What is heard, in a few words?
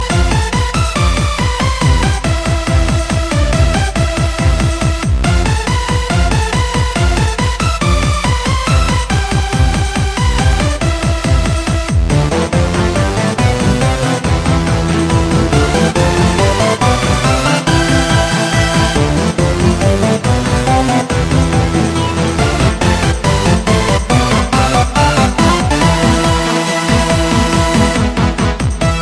techno; Legend of Zelda: Ocarina of Time